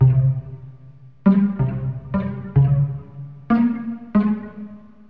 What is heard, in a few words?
strings, sad